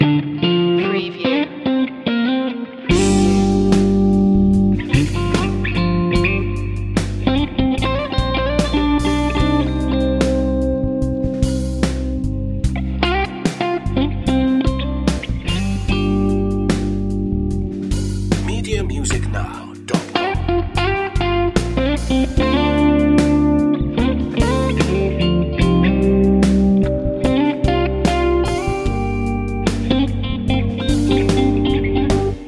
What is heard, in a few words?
strings and melodies loop; guitar solo